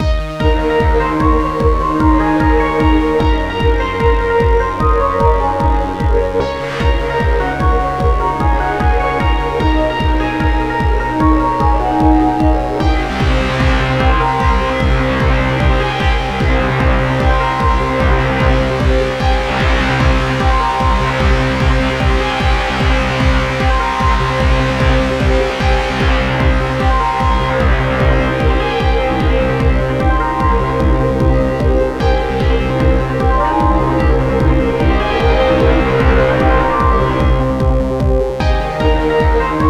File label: puredata trance